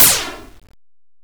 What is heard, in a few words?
mine explode; Station-0